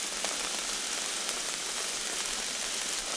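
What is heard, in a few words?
plasma projectile, plasma grenade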